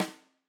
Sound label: Tam Rim, drum